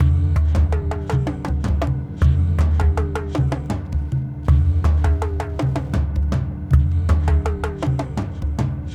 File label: Pot, World Percussion; Roto Toms, Cha, Conga